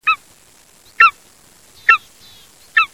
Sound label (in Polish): orzeł przedni, głosy